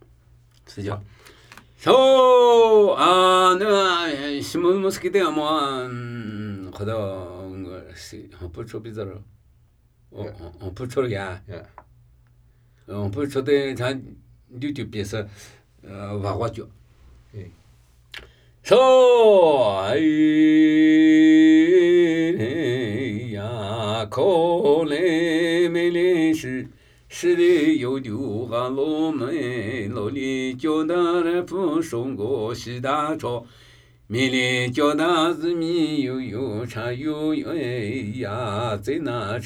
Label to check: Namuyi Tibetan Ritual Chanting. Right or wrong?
Right